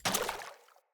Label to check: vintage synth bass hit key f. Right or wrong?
Wrong